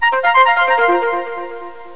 SLITHER; set type

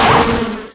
beep op; Robotron; PC Gamer 39

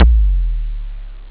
tom, drums; OctaMED SoundStudio V1

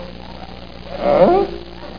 grunt; timallen

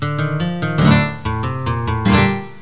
GUITARR, guitar; Pipo-Aprende musica con Pipo

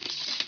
quiver arrow Pull; vampire demo

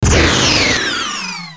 shoot; Q2 Codered

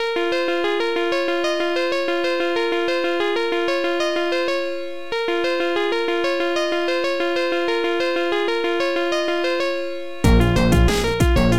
rap bells

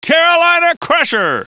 CRUSHER; mtmtrial; TRUCK

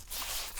foley foot scuff; Earth and Beyond; EA Games

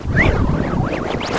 Magic; Majesty; OnlineGame

